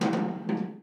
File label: cable stress; dust2